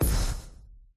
poof explosion with shine bomb boom blast cannon retro old school classic cartoon; epic stock media